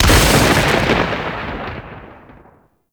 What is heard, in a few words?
Lightning Impact; Warframe; Teralyst; Rainalyst Abilities